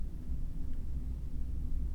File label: elevator start loop